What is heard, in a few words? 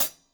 Hat Closed Soft; hydrogen drum samples